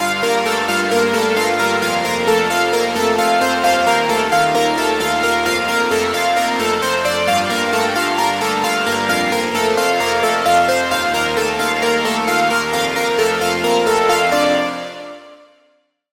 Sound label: Lead; Routed Sound; Serum 2 Expansion - Reality Maker Vol.1